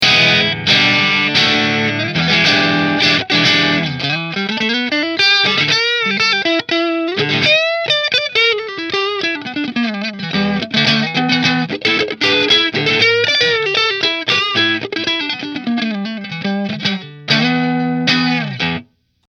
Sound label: Amber Burst Position 3 Marshall